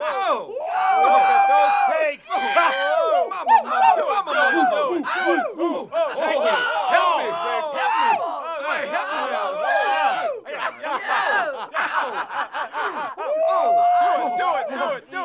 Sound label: Walla Rowdy Bar Dialo; bars & discos